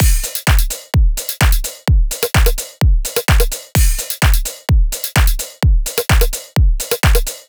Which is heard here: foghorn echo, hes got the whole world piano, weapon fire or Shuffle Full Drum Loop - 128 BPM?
Shuffle Full Drum Loop - 128 BPM